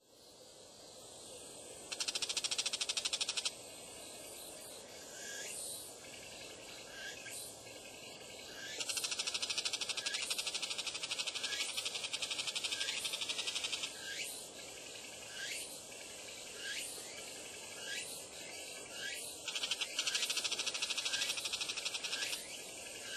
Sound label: bull shrike